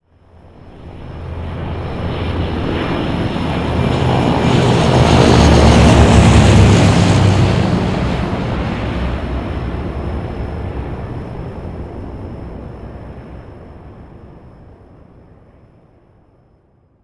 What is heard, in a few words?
c130 flyby